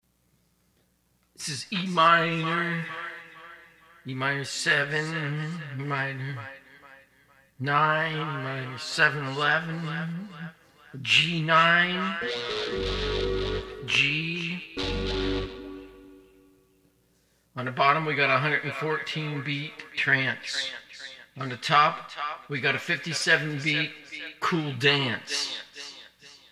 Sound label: Soundcheck